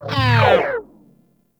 Proj Small Fire; Warframe; Lizzie

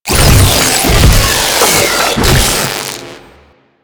Proto Infested Ancient Tentacle Swing Flesh; Abilities

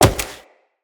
Wall Jump; Footsteps; Wall Run; Characters; Tenno

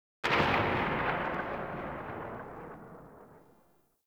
Arch Gun Primary Beam Tail Ext; Weapons; Warframe; Archwing; Hildryn

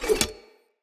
Throwing Knives Blade Flip Accent; Weapons; Player; Swords

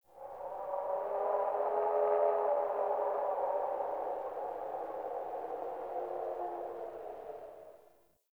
Wind Gust Howling Low, Venus Landscape; Warframe; Venus Open World; Ambience

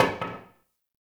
Single Wooden Impact; Warframe; Ambience; Nineteen Ninety Nine

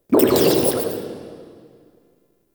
Interior Gurgle Slurps, Infested, Ambience; Misc Indoor

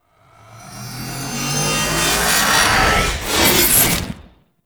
Backstab Hover Blades; Warframe; Warframes; Pax Duviricus

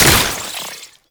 Mech, Infested Arm Cannon, Weapons; Warframe; Coda Bubonico

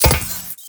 Moa Step; Characters; Warframe; The New War; Veso

